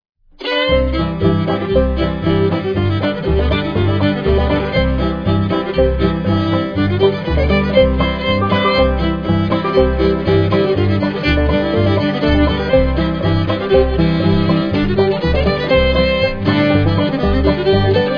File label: Barn Dance Polka, fiddle